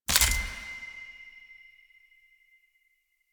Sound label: Collectible Fragment Collect; Duviri; Warframe; Collectibles